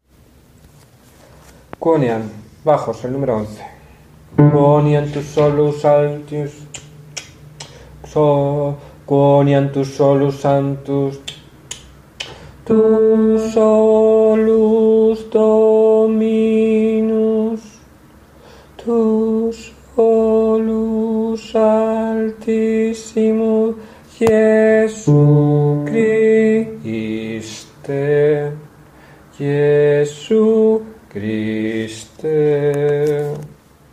bajos; quoniam

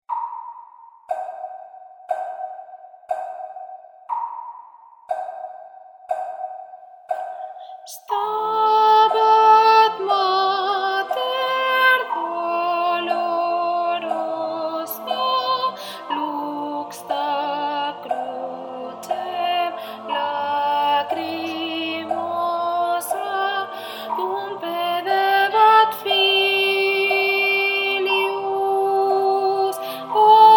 SOPRANO; El santo entierro

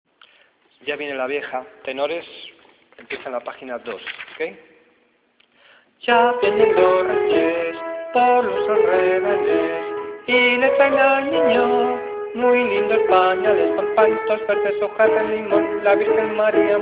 tenores

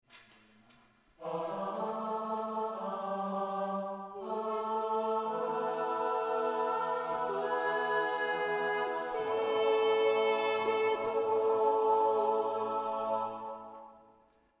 Sopranos, responsorio

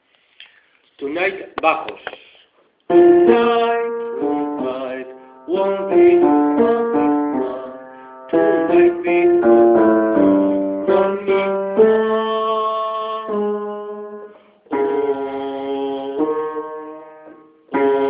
bajo

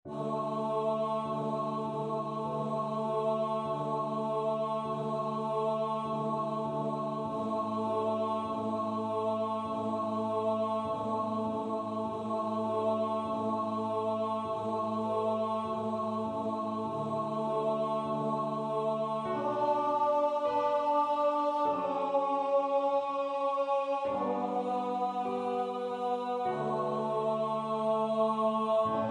TENOR; MisaBrevisJesus; acciongracias

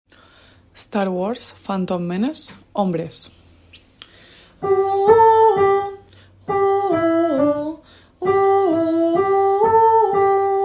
phantom hombres

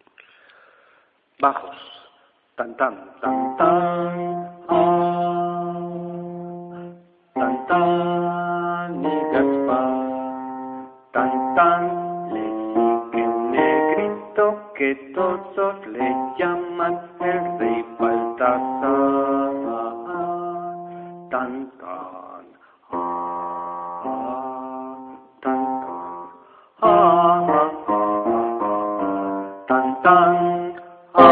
tan tan bajos

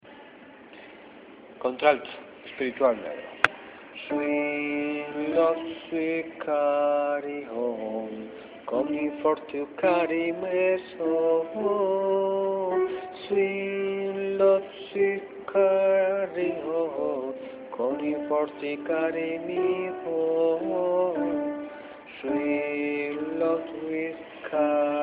altos espiritual

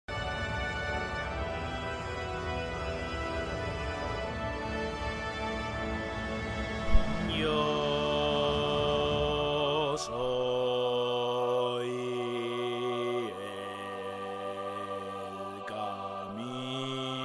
BAJO; Yo soy la luz del mundo

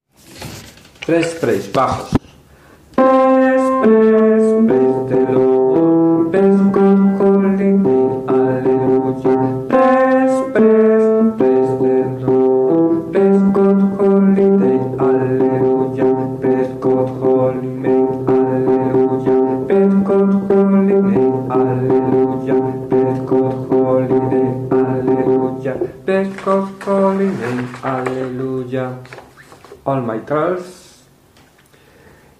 bajos; praise all trials

